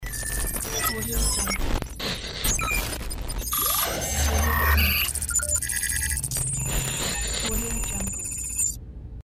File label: futuristic technology command room ambience; bbrealsound